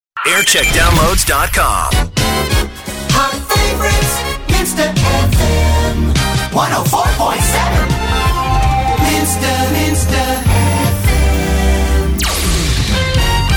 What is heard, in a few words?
Jingle Montage; Minster FM Yorkshire; JAM